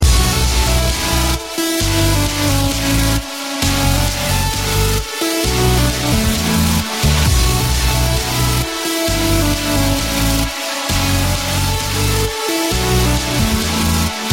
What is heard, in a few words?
Ska